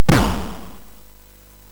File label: SFX, MOS6581; Narco Police; MOS6581R4; hvsc